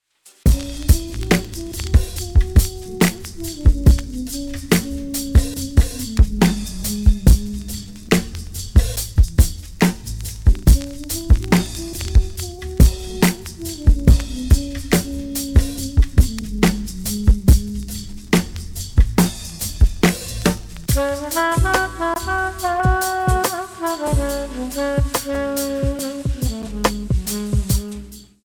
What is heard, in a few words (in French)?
jazz mood